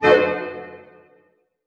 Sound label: harp hit C DIM; Sound On Sound - Peter Siedleczek's Orchestra